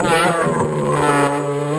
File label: ahhhhhhhhhhhhhhhhhhhhhhhhhh